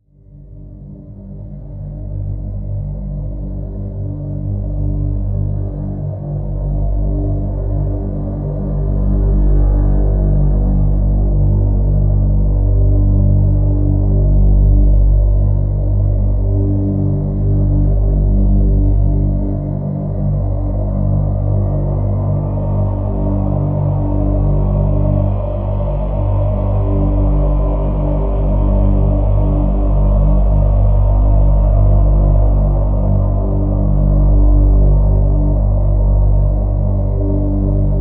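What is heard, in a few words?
Drn G, drone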